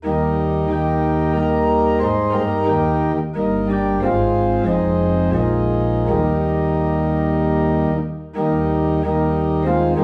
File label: Organ in E Flat; Be Thou My Vision